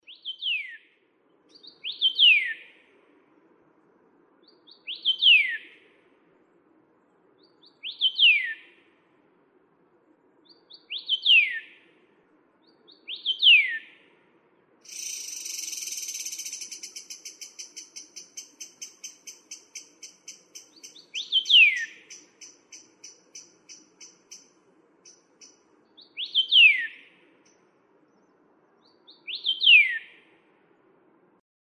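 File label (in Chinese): 白耳畫眉, Yushan National Park; 白耳奇眉; 畫眉科/留鳥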